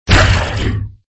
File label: zombie pain